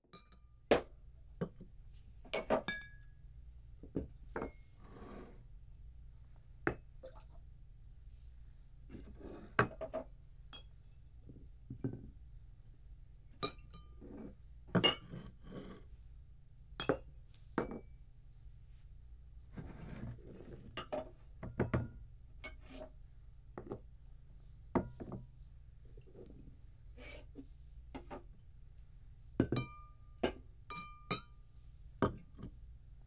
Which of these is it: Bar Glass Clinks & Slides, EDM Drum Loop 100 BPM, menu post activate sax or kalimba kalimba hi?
Bar Glass Clinks & Slides